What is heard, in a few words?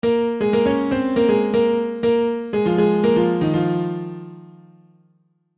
Piano Test